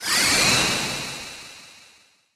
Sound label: golden light